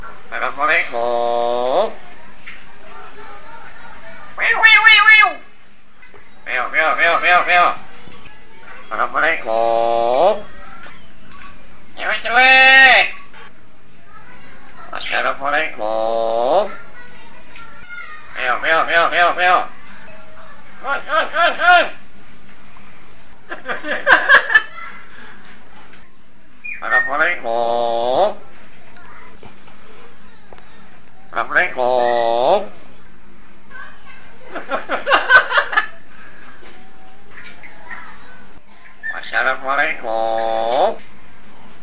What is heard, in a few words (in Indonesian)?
beo latihan